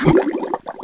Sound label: pl drown, player